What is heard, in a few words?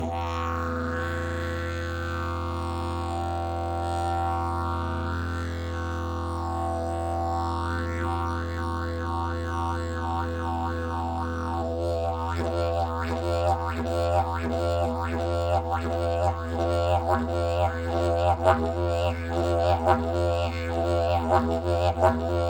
didgeridoo; briza kouzelnik; 30 mm; Ladění : E; Tomáš Dufek